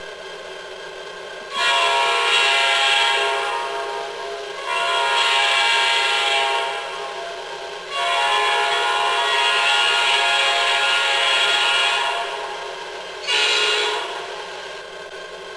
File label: Diesel Whistle Only, train